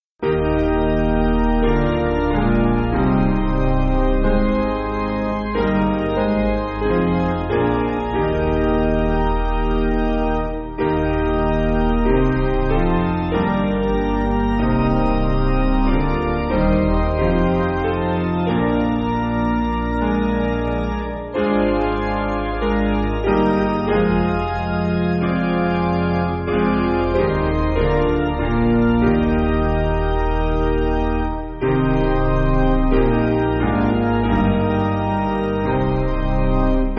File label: Piano